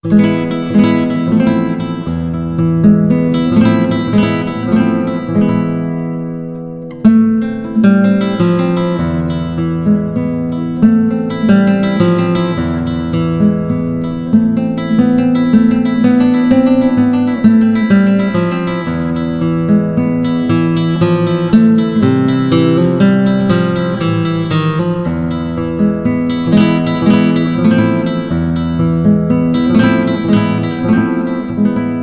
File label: solea